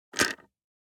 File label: DICE on Hard Wood, Grab, Standard, Six Dice; Samples; ArticulatedSounds; DICE Sfx Party (Extended)